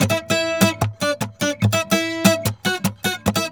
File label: MERENGUE, World Grooves